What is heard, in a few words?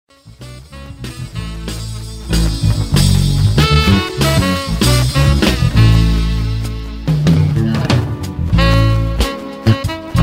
Jazz